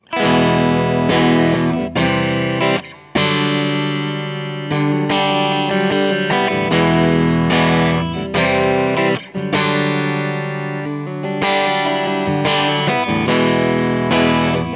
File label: Middle PU Clean; Charvel Marco Sfogli Signature